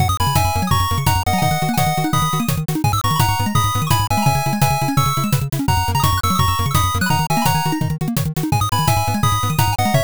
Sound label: bgm drive